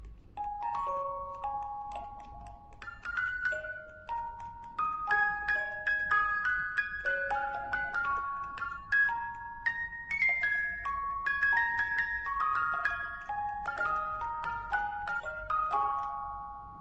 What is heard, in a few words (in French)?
boite musique